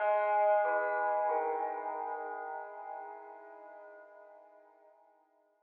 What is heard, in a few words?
Plucked Horror Stinger